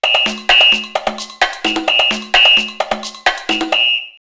Mary Ann 130, drumloops DrumMachines; X-Static Goldmine

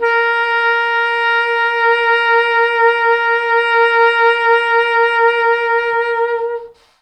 T Sax A#3

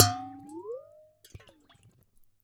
WATERBEL, WATERBELL; Univers Sons - Raricussions

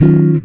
GTR CHORD; Univers Sons; Jazzistic

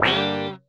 GUI MULT; EastWest; Dance Mega Hip Hop Electro

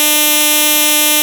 noise, NES; MIDINES

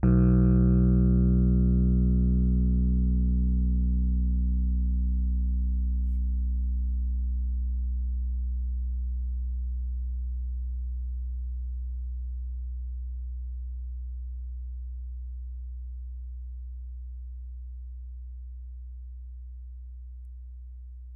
B1 LO, Rhodes Mark II; CEPCo Sample Series Vol. 3

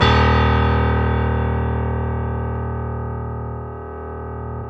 PIA GRDPI, PIA_GRAND, Roland

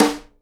SIDE STICK, Live Snares

